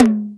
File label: Roland D-10 L.A; Drums